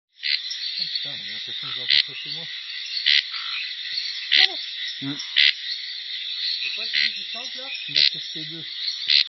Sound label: Scinax sp2